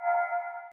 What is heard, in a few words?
MOVE; C64 Dreams; Multi-Sound Default